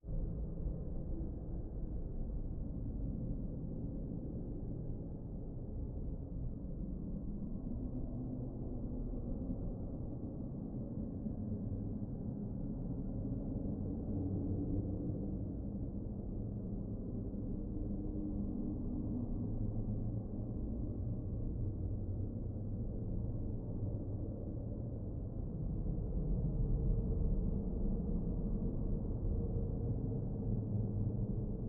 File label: Horror Ambience